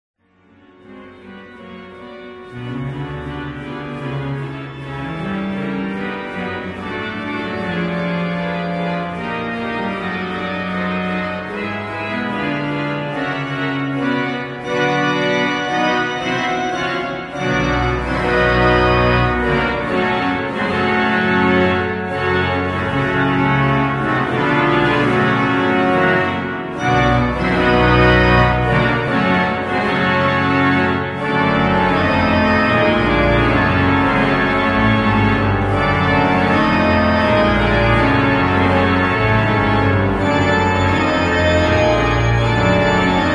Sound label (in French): Orgue; Pieces heroiques; Cesar Franck